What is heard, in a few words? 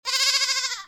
goat; grubs; weapons